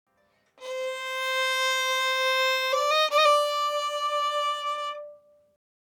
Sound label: Facets Violin Bridge Noise